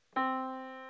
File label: Yamaha Disk, piano sound